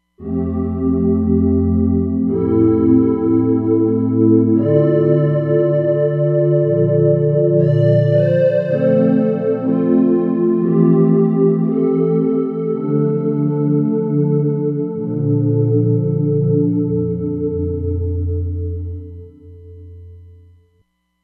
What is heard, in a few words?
FS Hollow, Roland Fantom XR